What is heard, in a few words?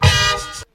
sorry wrong beat